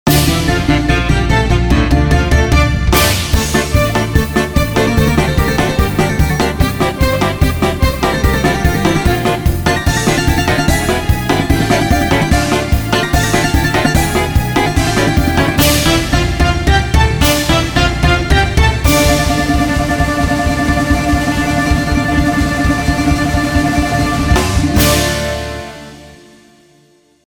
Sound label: Bouzouki Intro